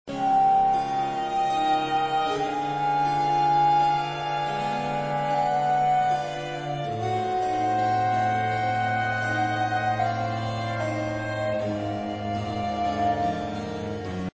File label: american baroque; MagnaLoops